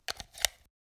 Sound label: energy handheld generator foley; soundmorph